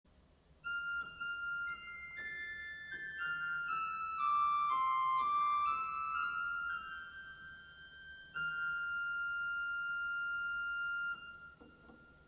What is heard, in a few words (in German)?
HW Superoctave 2